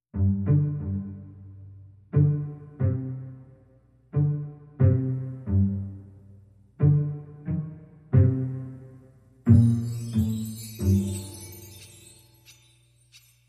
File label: Drums and Bass Mix